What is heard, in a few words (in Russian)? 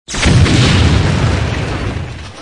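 взрыв разорвавшегося снаряда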